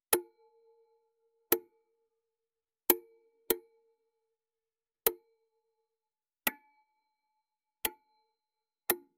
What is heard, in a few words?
short mechanical lid; ui ux